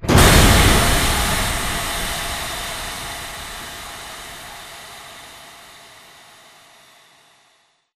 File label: amb steam shutoff; outpost207; Titanfall 2